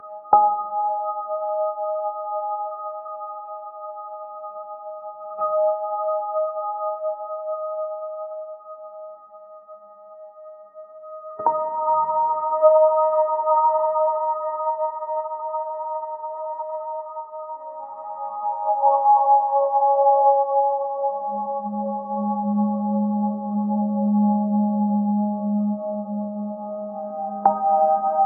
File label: alpha styl, minimalist